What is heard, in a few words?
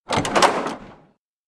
door house open; spica; anemoi